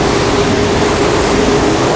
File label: Tornado run; Seer; GamigoNA